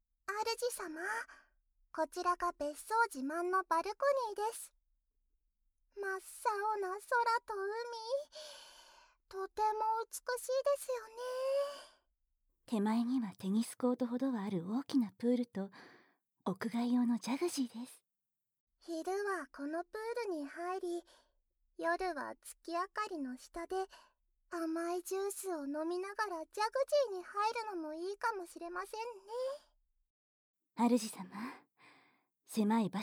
さざ波を聞きながら静かに耳舐めマッサージ(SEなし), ASMR

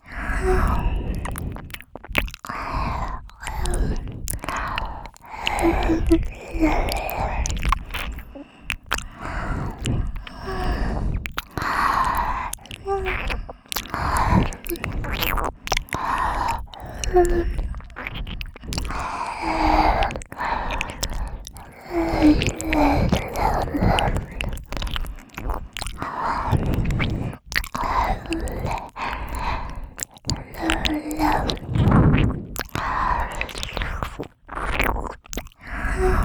右耳舐めループ（耳塞ぎver）

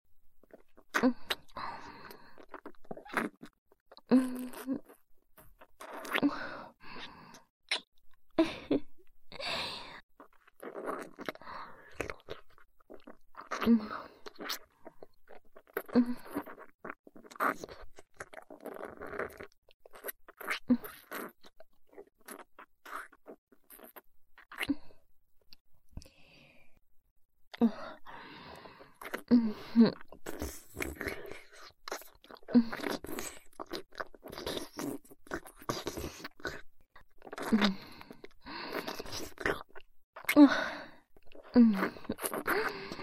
キス

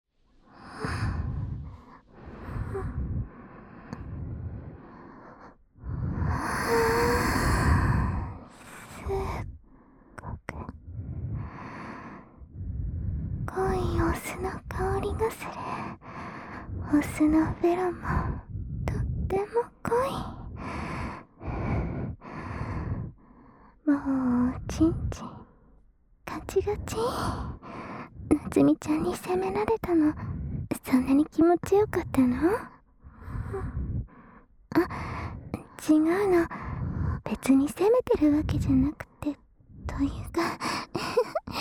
両耳舐め; 幸せトロトロえっち

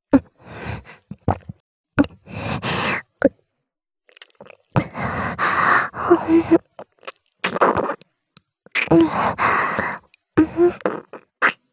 リップキス

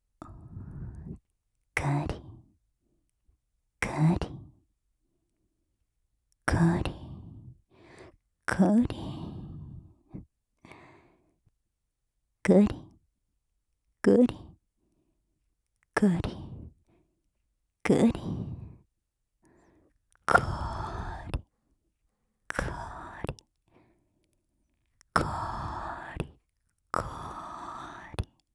お姉さんカリカリゆっくり; オノマトペ; 右耳

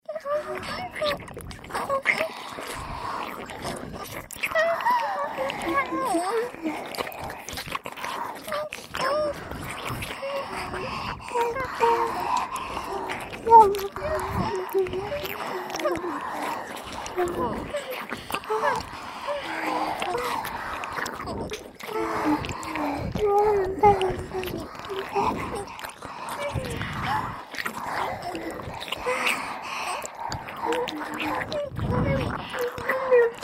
ディープキス＋イラマチオ＋両耳舐めループ（通常ver）

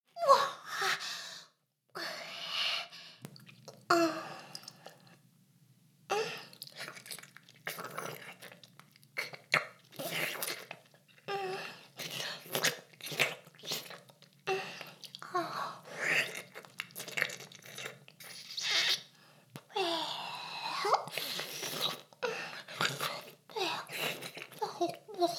フェラ音声; ダメなメイド

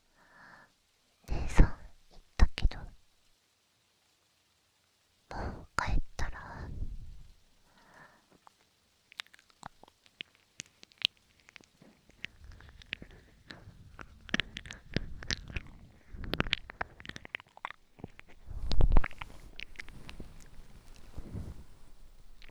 妹と 二人きりで 耳舐め; ASMR

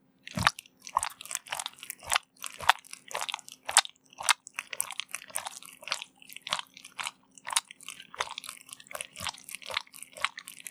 クチュクチュ普通, ASMR

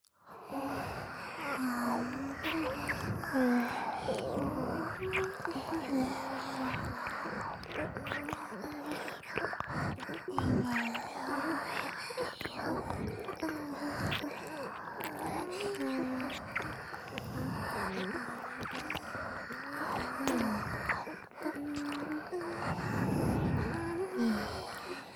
吐息×喘ぎ×耳舐めBGV; オニキス

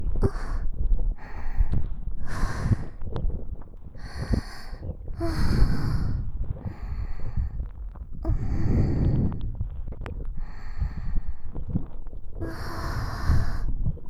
ねっとり触手音+片耳のみループ

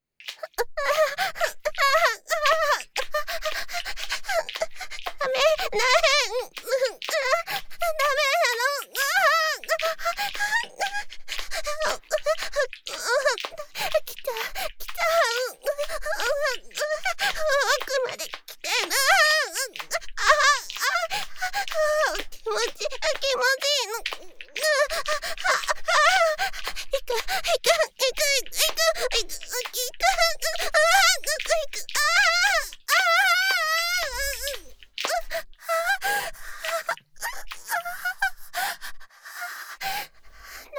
絶頂（SEあり）; 高潮

